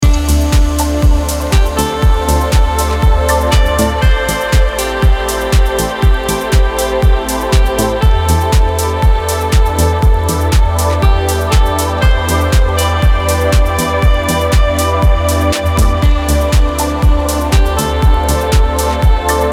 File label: Quiet Prudence (120BPM)